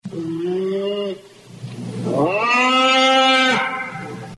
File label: BEAR DEATH MOAN